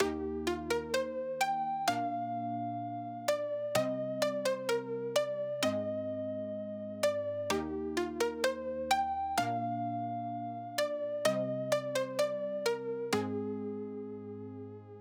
Bridge plick, 128BPM

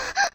Moan

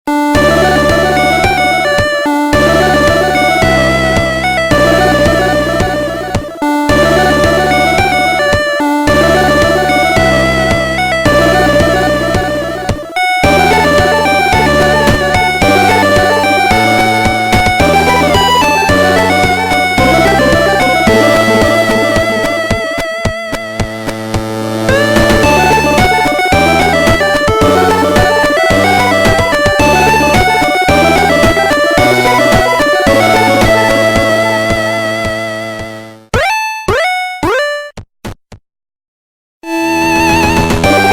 Chiptune

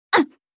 VOX Get Hit; Li Li; Heroes of the Storm; warcraft

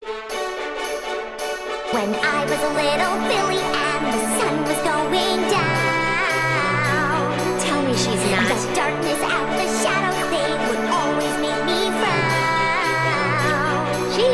Orchestral Remix

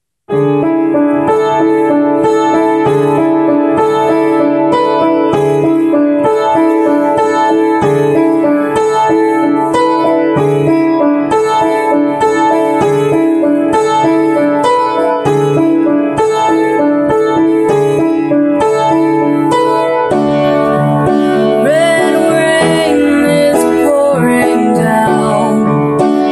Piano; Rough demo

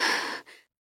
Breath; Lewd SFX

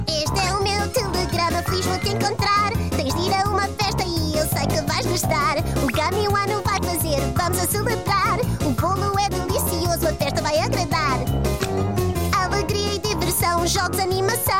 Singing Telegram (European Portuguese); Season 1

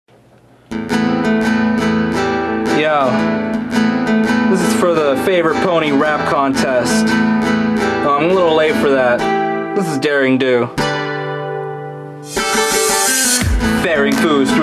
Rap; Daring

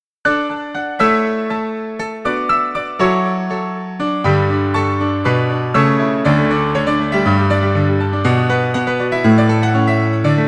Piano Medley; MLP-FiM Piano Medley Part 1

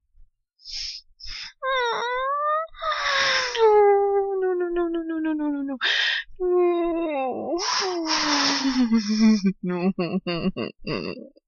Whine

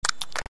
slosh; Lewd SFX

